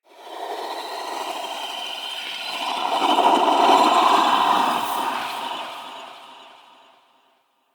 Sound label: Eerie Texture; Ambience; Red Night